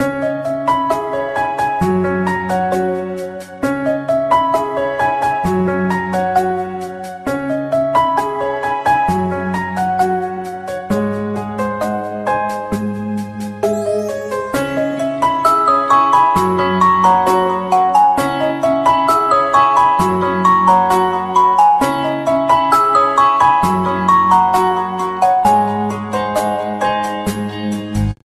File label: Beautiful Alarm Tones; ringtones